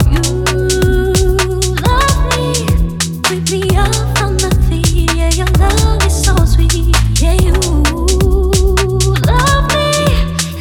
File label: Garage